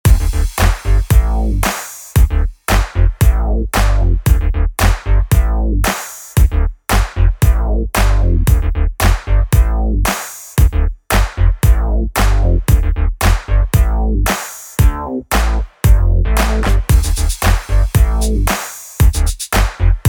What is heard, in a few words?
INSTRU Bpm114 P-Funk